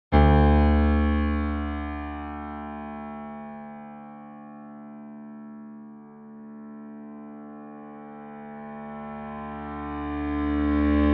Piano D Swell

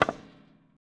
Concrete Hit

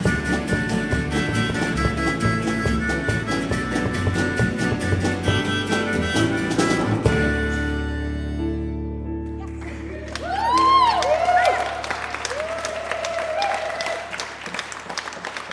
Harmonica

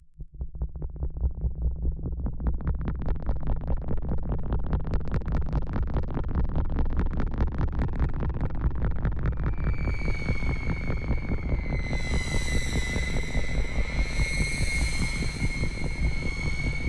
Unreal Atmosphere

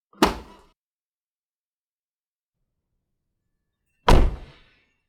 Car Door Opening And Closing

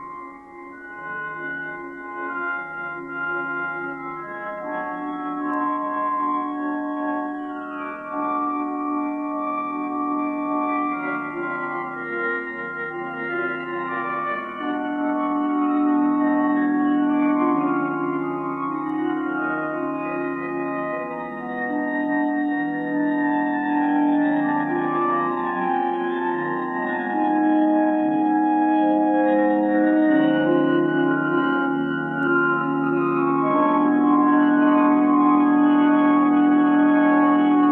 Organ loop

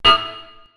Metalic Hit

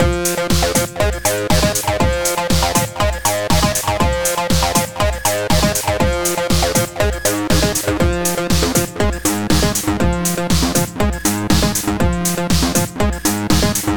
Techno Loop

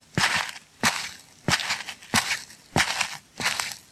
Walk

